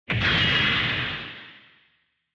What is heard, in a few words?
Retro Anime Robot Low